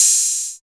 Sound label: OP HAT; East West - Phat & Phunky CD2; 90's Sample CDs